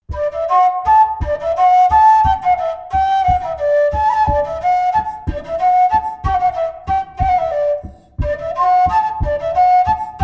Valse montagnarde

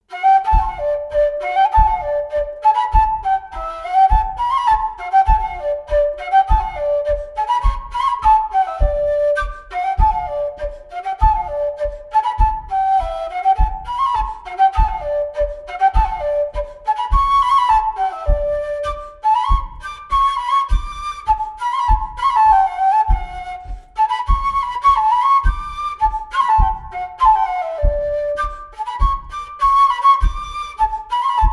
Bouree d Auvergne

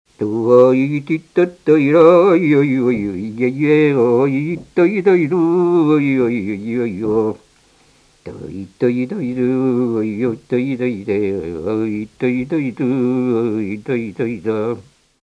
Bourrée; Bourrée au tralala

